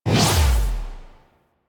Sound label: Melee Heavy Attack Lift Release; Warframe; Weapons; Whooshes; Heavy Attacks